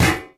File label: physics turret gibs